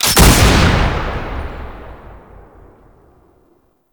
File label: Assist Shot; gameplay; Warframe; Nineteen Ninety Nine; Quincy